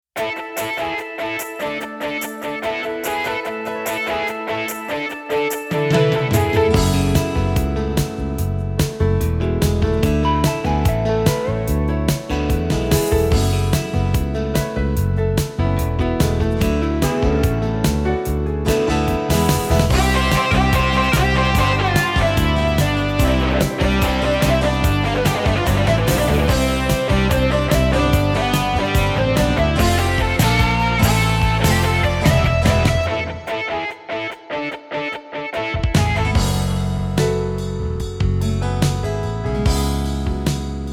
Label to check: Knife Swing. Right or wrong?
Wrong